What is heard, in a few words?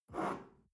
shell grab from table, gastronomie; berufe; soundpool